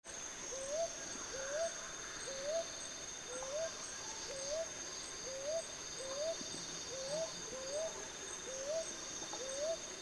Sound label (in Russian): iglonogaya sova golos